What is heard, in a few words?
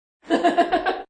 Ldy Lgh, A lady laughs happily.; Laughter; Voice